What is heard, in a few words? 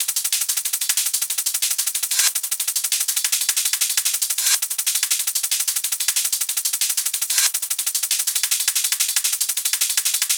top loop